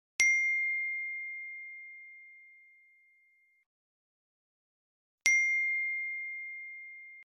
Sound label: birdsss